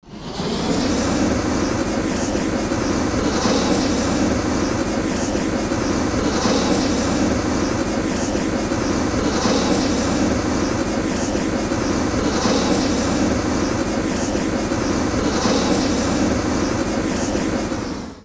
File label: torch cut; fgrunt